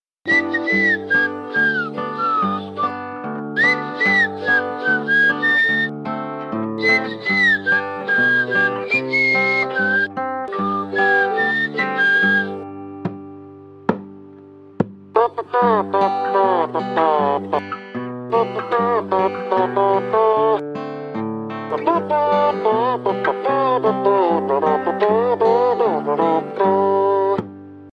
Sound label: whistle ringtone; robin hood